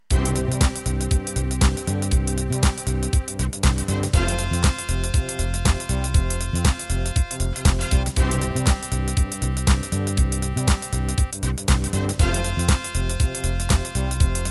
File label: House